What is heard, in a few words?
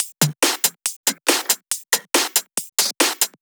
Loops 140 BPM Hihat Percussion Loops